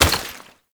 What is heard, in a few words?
Step Water, Footsteps